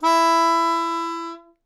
Melodica Long, Long Notes Chromatic

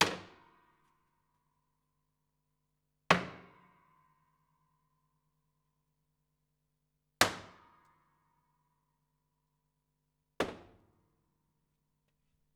Body Thump Sparse, Piano Destruction

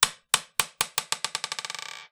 Marble Bounce; Balls

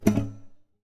Classical Guitar Double Damped Pluck; Classical Guitar Extended Technique Stereo

